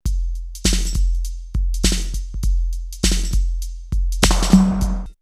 R8, drumloops, DrumMachines; X-Static Goldmine; 90 s Sample CDs

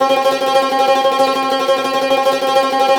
CUMBUS Tremolo

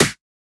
Snare, Dance Drum Kit

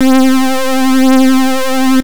Synths Note C; House; Best Service; House Definition VOL-1

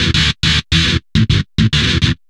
GTR, Chemical Beats

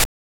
Hat; Genesis

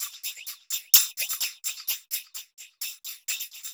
TAMB; Zero-G; Chemical Beats

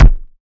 KEYS KICK

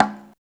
CONGA MID; East West; Phat & Phunky

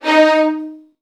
D#1, VN 16TH, Virtuoso Strings; ILIO; Kirk Hunter

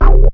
WHINE, Synths BASS

